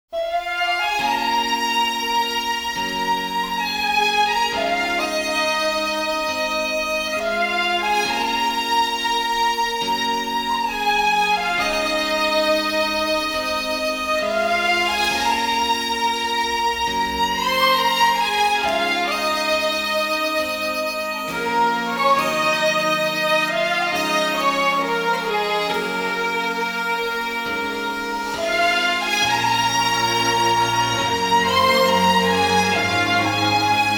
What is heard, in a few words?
instrumental